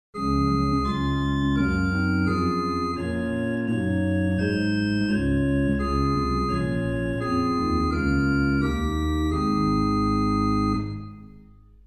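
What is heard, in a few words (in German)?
Rohr 8, Gedackt 8, Nasat 3, Terz, Ped Sub 16, Oct 8; Silbermann middle-sized church organ; St. Anne; Hin (solo): Gedacktes 8', Nassat 2-2/3', Tertia 1-3/5' Hw: Rohr Fleute 8' Ped: Subbass 16', Octavenbass 8'; Reinhardtsgrimma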